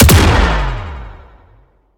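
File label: Sci weapon Shot Medium Gun Sharp Shooter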